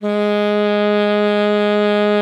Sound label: G#3, alto sax; Xsample VOL-2